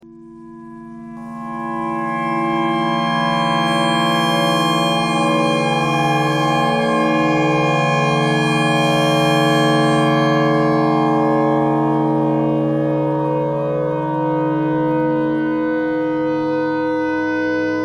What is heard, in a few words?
clarinet notes e clarinet thread noise